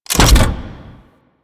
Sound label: Extending Bridge Stop; Warframe; Ambience; Corpus Gas City; Gameplay Remaster